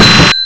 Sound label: PINBALL; Carmageddon Demo